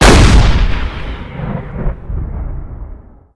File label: fire, tank